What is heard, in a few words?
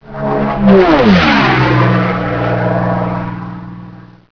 P-51 Mustang Fly By